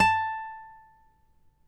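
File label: nylon, FLAMENCO, Guitars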